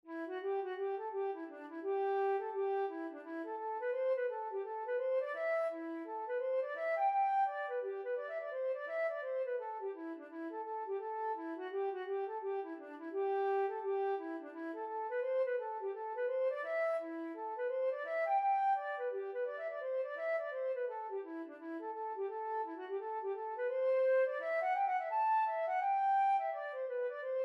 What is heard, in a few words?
flute